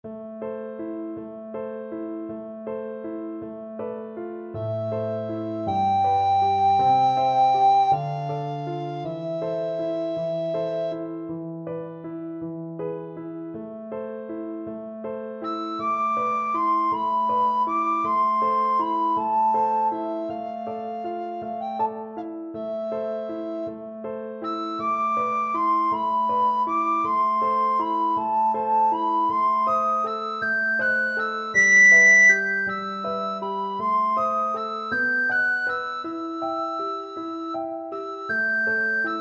recorder

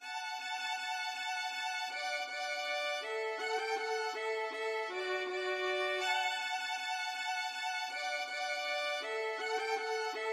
duos marcia violin duet

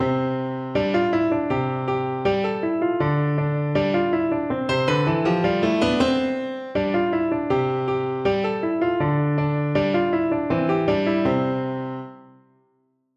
offenbach can can easy, piano

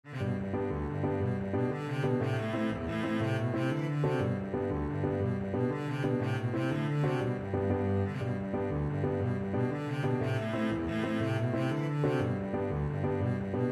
irish jig medley, double bass

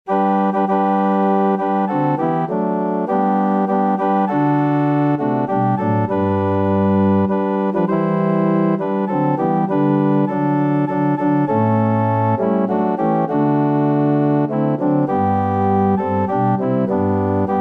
ORG, organ

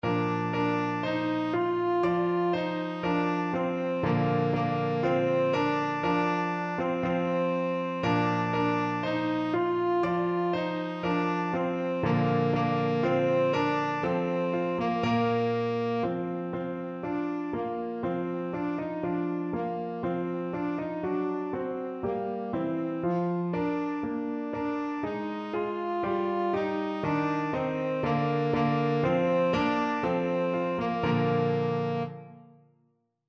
tenor saxophone

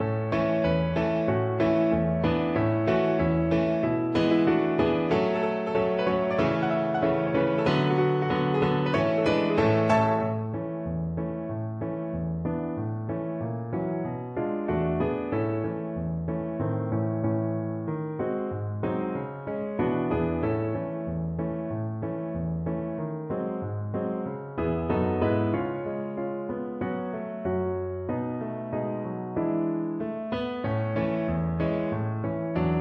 cello

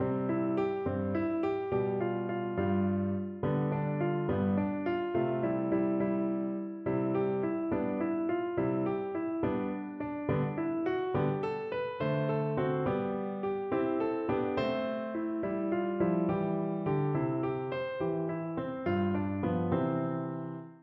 pat a cake, piano

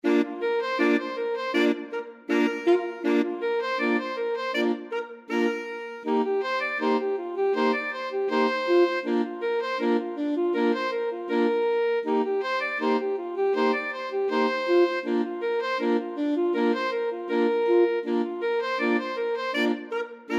alto sax quartet

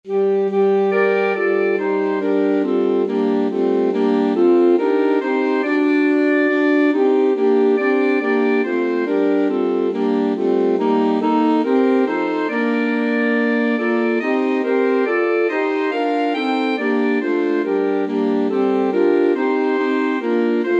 god rest, alto sax quartet

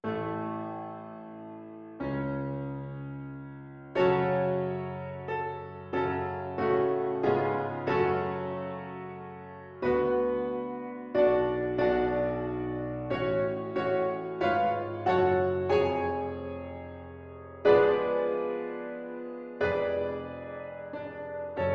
pno sonata PNO; beethoven; sonata 29 Op 106 3rd mvt

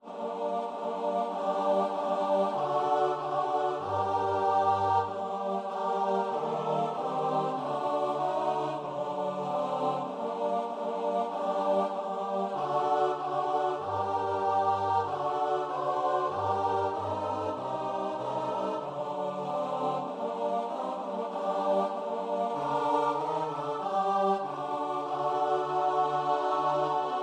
CHOIR

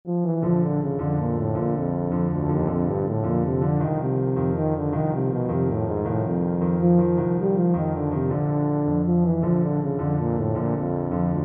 mexican hat dance, tuba

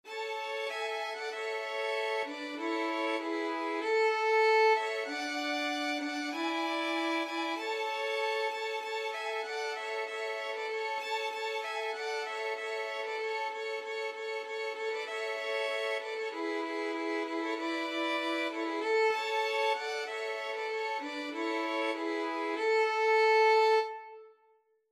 violin trio; joy to the world

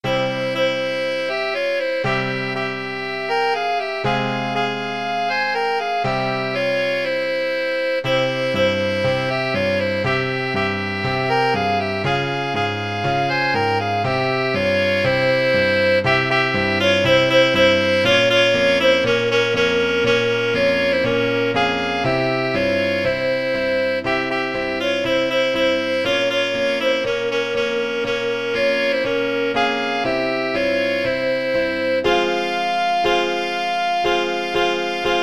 hava nagila, clarinet saxophone duet

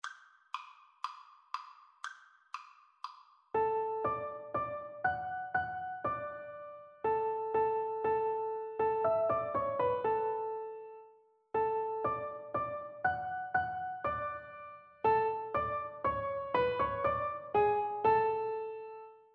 piano duet

